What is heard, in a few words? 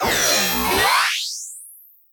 fx long power up